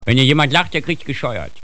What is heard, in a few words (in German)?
lachen